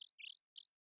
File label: sting bird chirp; Rust